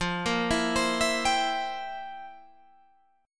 F Major 7th Suspended 2nd Suspended 4th, F, down, Guitar, Open G